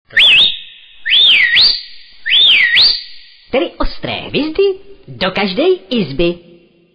Whistle

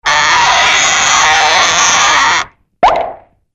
Tap Scratch Up

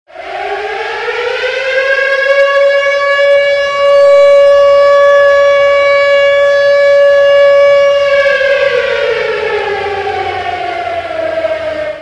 SMS Alarm SMS

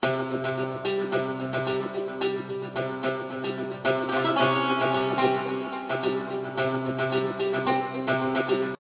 ringer; Ringtones

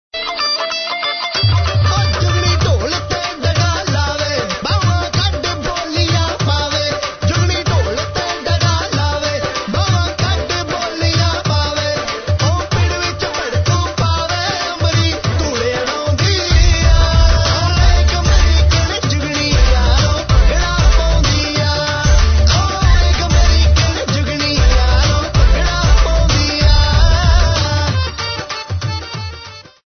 American Jugni, Punjabi

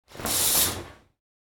shower curtain

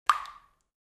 water drop

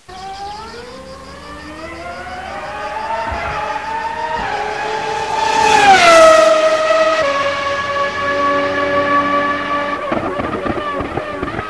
F1 Mclaren Mercedes; Ringtones; Sound Effects; 2000

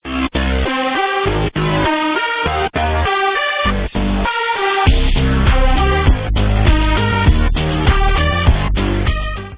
ringer; snom3xx; Ringtones

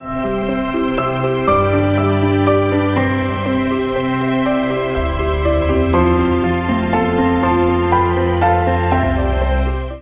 ringer; Ringtones

